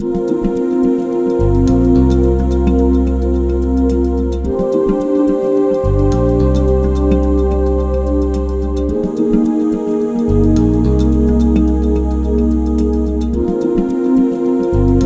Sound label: BGM Tension